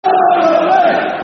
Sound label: Come On Rovers, Football Chant (Soccer Song); Doncaster Rovers Drfc